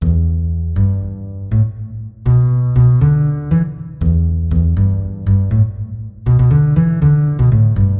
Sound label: Blues Bass Grove Em 120